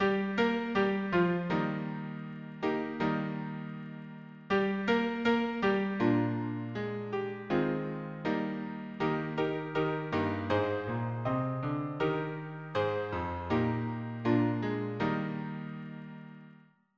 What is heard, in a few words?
hymnary harmony piano